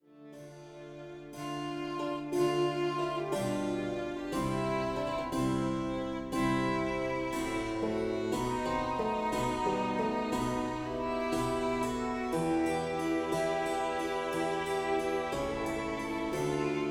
ballroom; Shadowhand; macos windows gamerip 2017

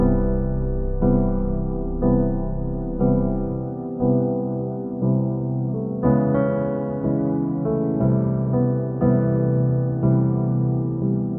music chill loop; Necrobarista Final Pour

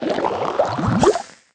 Soda Bubbles Rise; Candy Crush Soda

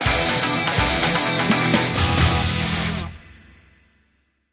intro BGM; Naruto Ultimate Storm; android; mitato Y

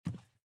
run wood; out of reach treasure royale 2020 gamerip pc

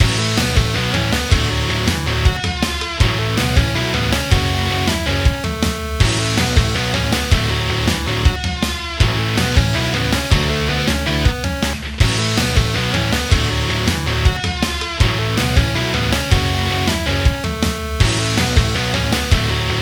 3d Music; Tecmo Bowl Throwback; XBLA iOS PS3 Xbox 360

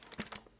book page turn

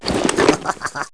Ui Upgrade Jetpack; Rabbids Big Bang; Android iOS Windows gamerip 2013

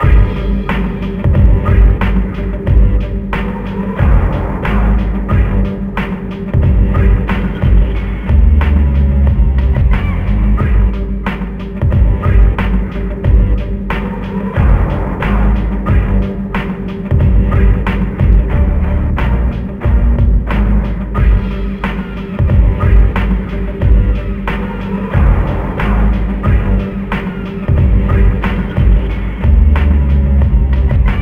Radio Inside Far; Urban Radio 1

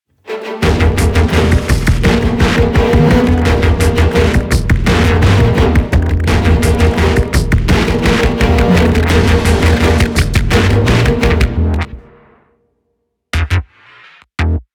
Cinematic Carpark Spotted; Get Even

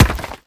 stone hit

